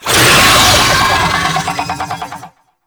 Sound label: Boss Vocal Alerted; Enemies; Vocals; Warframe; Oraxia